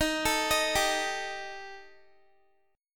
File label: D sharp Major Flat 5th, Guitar; arpeggiated